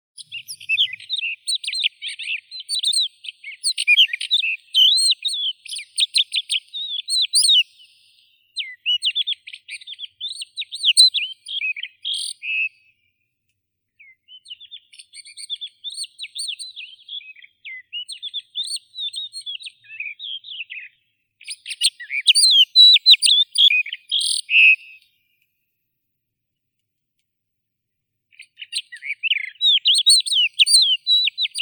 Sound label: zhavoronok hohlatii galerida cristata